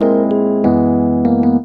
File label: CHORD, Drum & Bass, 150 BPM